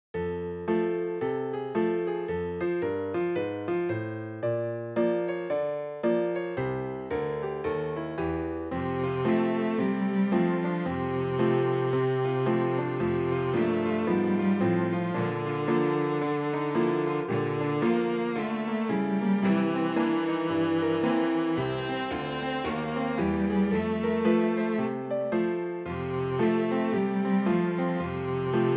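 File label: cello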